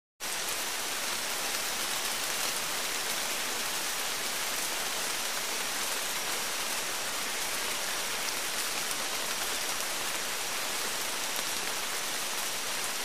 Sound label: Tropical Rainfores